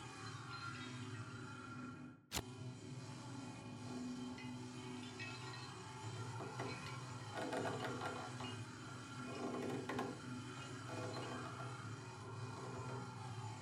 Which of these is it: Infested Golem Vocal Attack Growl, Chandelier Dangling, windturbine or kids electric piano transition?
Chandelier Dangling